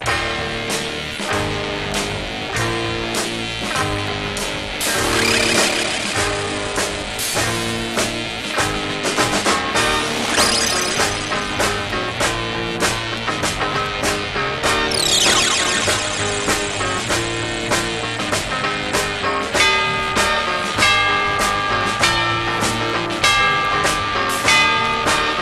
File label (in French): sonnerie; pierre henry